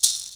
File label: ORCH SHAKER; Greytsounds VOL-1; ORCH PERC 1